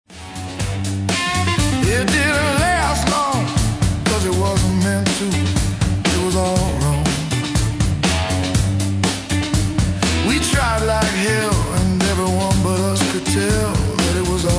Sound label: All Wrong Rock Blues Raw; Rock Soul/Blues